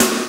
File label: sparkly snare single hit a sharp key